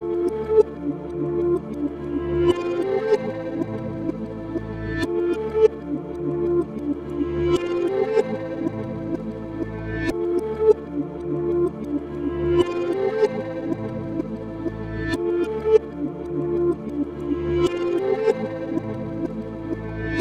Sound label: Space - 95 BPM D Min Reversed Sample; Melody Loops; Loop Stems; Cymatics